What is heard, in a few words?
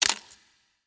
clicky